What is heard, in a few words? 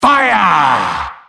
wheel fire